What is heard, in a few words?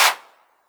small reverb clap sound c key, percussion single hits one shots